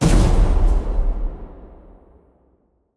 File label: HUGE LARGE HIT LANDSCAPE; WNF ANALOG LIBRARY